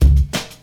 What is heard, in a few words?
oom pah, Drum loops, Funky